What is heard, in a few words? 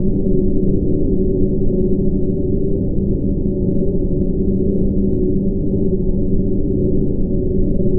Drone Ambient; Drums & Drones